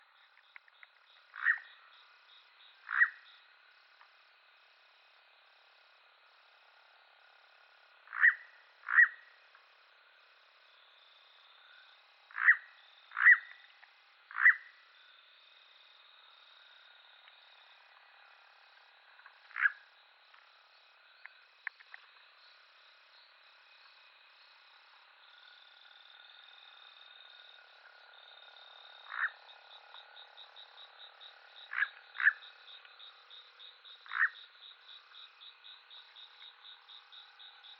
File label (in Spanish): Chotacabras torico